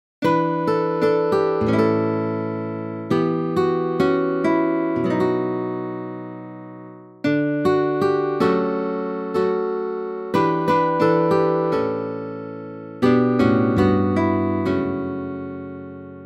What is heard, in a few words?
guitar Fraction; Hymnal 1982